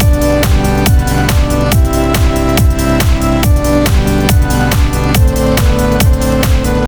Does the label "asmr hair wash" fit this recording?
No